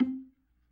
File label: B3 PERC, Vintage Keyboards